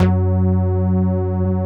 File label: PUNCH BASS; BASS SOUNDS